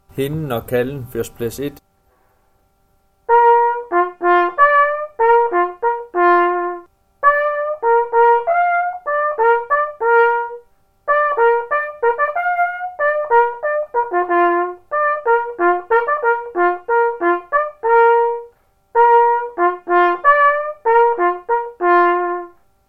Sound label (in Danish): hinden kalven